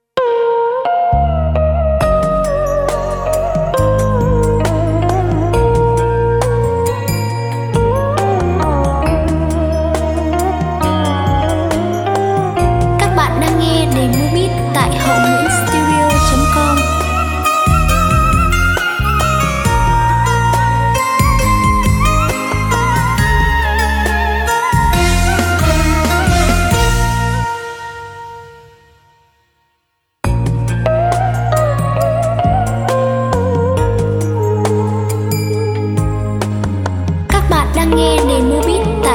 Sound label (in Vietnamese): beat